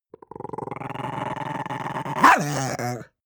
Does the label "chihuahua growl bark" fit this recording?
Yes